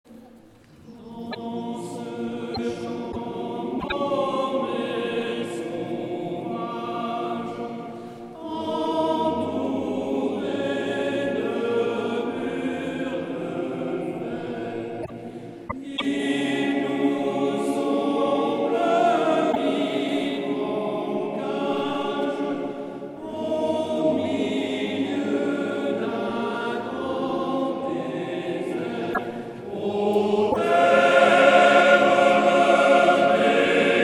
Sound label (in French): Chant des marais